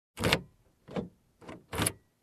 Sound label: Unlocking Car with key